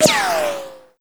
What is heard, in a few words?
bullet flyby slow; gamerip; shattered horizon 2009